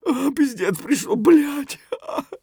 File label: hurt neardeath; Escape from Tarkov